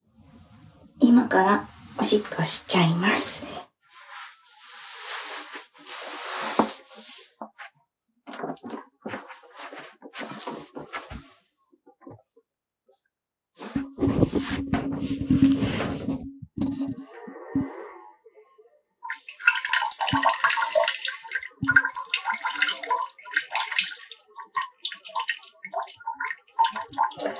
おトイレで！オシッコですッ！

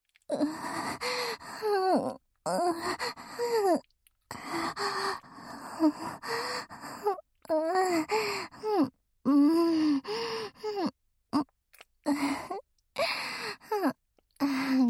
耳舐め素股オナニー; レア

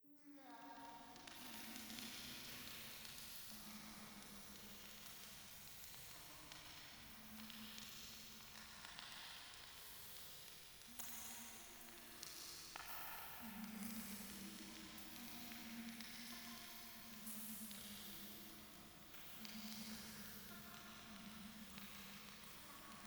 ゴースト フェラチオ 暖炉

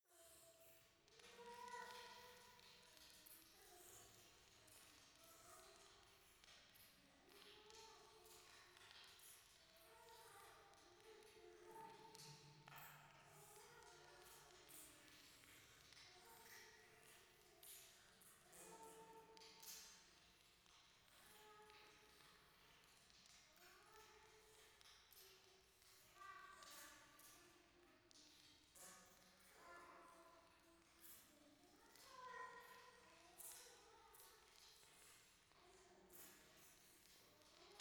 夢の中 イラマチオ 暖炉

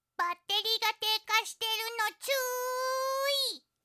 バッテリ低下アラーム

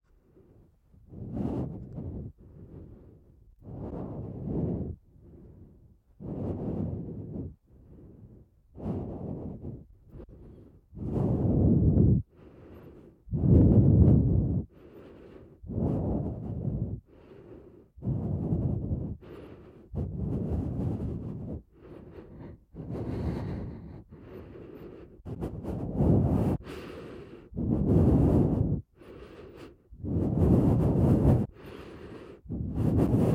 女子大生Aさんの全力スクワット鼻呼吸

